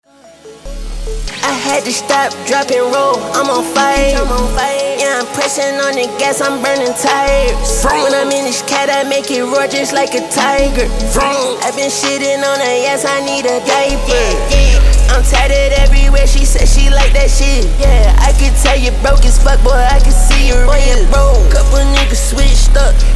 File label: HIP HOP